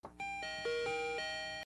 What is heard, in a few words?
Greeting Card